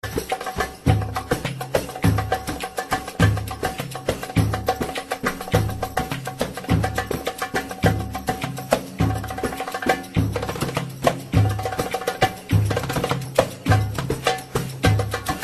Arabic Dance

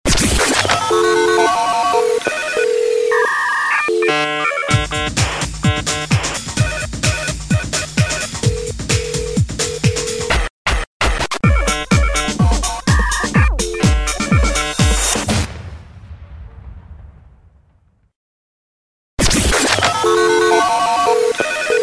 Electro

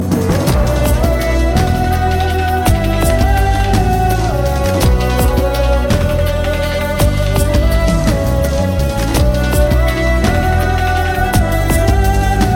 Violin Ringtone

